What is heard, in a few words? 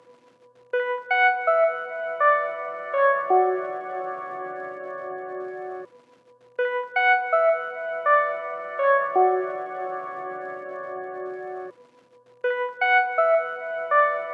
Moog One pluck, 164 BPM B Min; Cymatics; Horoscopes